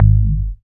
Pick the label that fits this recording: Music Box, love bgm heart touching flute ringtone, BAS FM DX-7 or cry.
BAS FM DX-7